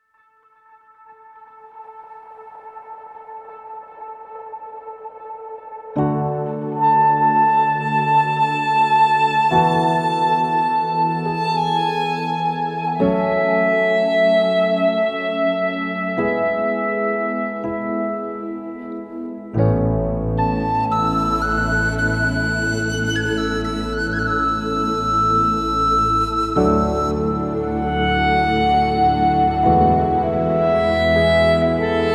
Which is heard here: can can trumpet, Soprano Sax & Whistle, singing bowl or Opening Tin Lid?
Soprano Sax & Whistle